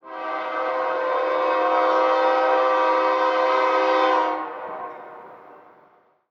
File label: train horn distant; ambient